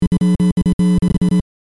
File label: Buzzing bursts speakers malfunction; headphones and speakers